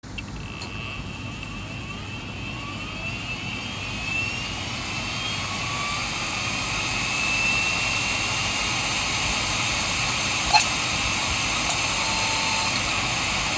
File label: HDD Startup; recuperation de donnees sur disques durs